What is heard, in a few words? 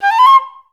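flutes C5; Best Service; Peter Siedlaczek's Advanced Orchestra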